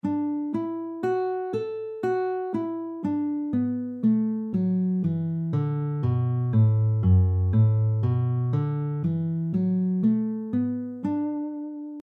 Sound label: D Maj pentatonic scale C position